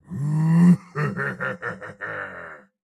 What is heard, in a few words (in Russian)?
Смех; Турбосвин